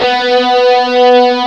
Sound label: GUIT B3; AKAI S-Series CD-ROM Sound Library VOL-2